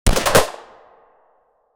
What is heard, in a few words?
single mp5 9mm gunshot; firearm